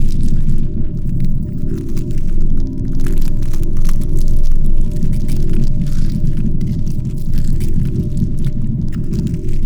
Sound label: pro ship colony idle; biogenesis